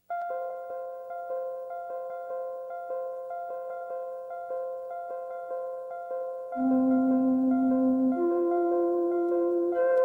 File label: two tone piece